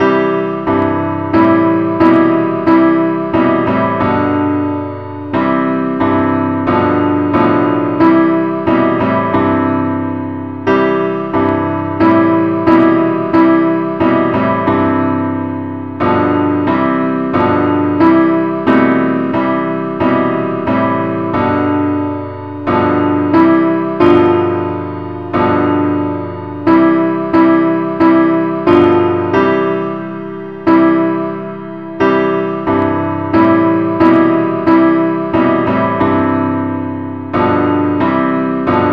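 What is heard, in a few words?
advance accompaniment key c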